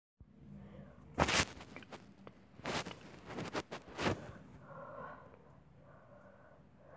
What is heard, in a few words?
Edgy breathing